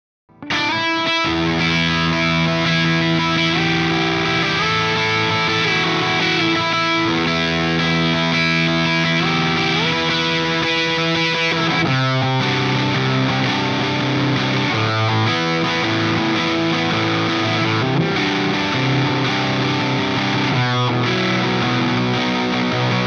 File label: FUZZ